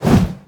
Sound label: evil hammer